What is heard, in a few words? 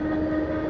motor, Vehicles; GT6M